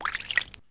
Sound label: Spin; Finding Nemo; XBOX